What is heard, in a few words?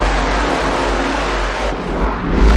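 Glitch Destructive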